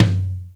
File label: beepee, Toms; Real